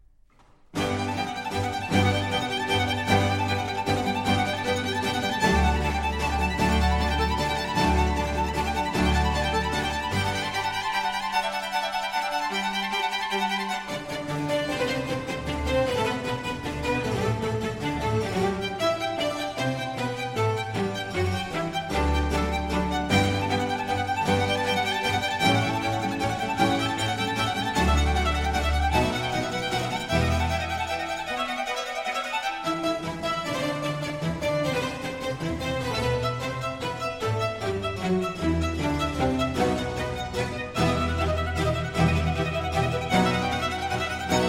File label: Concerto for Strings in G Major, Allegro; RV 150; Vivaldi; Concerto Italiano